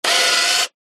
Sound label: technology camera dslr lens zoom canon dslr eos 6d; zapsplat